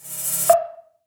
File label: Action Pop Up; Sound Ex Machina